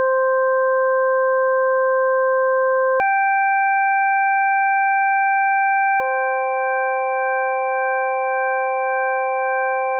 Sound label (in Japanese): ド-ソ(平均律複合音); 実際に平均律と純正律の音の違いを体験してみましょう。; classical music